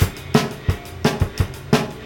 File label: witchcraft backbeat